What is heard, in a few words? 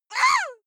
female lunatic shout frightened short; schreie; menschen